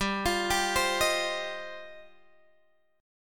G 7th Suspended 4th Sharp 5th G, Guitar Open G

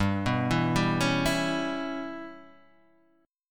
C sharp Minor 7th Flat 5th G

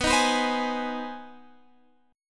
C Minor Major 7th Sharp 5th B, chord

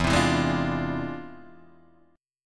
E 7th Flat 9th Sharp 5th; strummed; chord; JGuitar

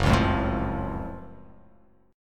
Gb Minor Major 7th Gb down; strummed; JGuitar

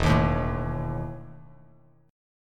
E Major 7th Suspended 4th Bass Standard; EM7sus4 strummed

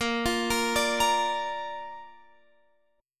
A sharp Major, Guitar, Open F; arpeggiated; JGuitar

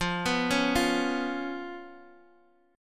B Suspended 2nd Flat 5th, F, chord

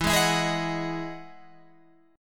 E Major 9th E down Guitar Open G

